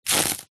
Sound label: nature ice thin crispy movement crush